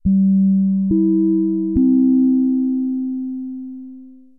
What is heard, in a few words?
gec chime